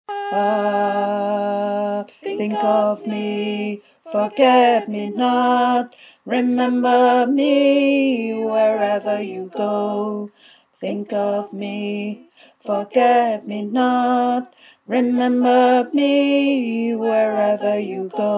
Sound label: low tenor